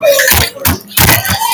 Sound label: bonk bonk bonk fuu